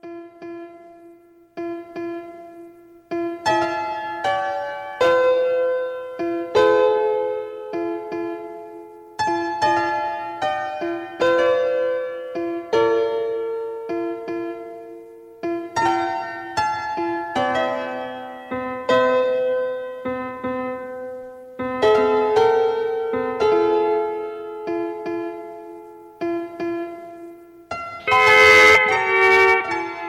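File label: round dance Instrumental Two Step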